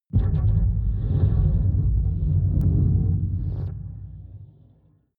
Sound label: metal rumble drone